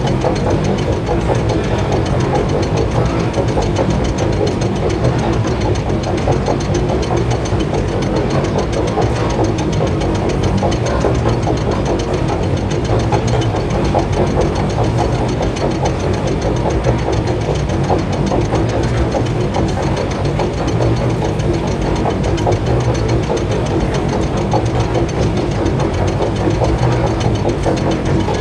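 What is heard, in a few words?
Assembly Conveyor Move Distant; Boomtown; Titanfall 2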